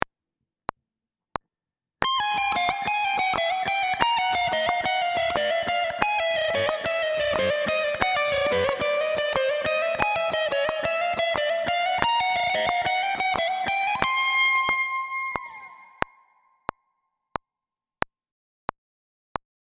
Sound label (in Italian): Esercizio slow, guitar